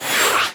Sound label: menuback; osu!